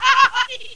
klown hit; CD Action; soultrap

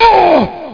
death; Games World; Quake; aamoon; PLAYER

